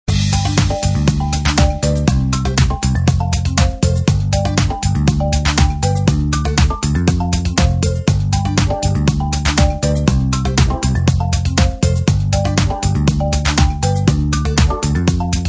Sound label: Music (With Instruments)